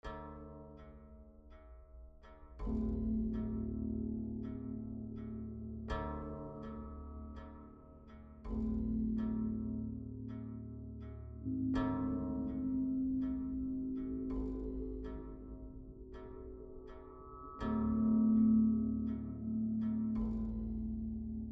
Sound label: Combat Tension Ambient; The Last of Us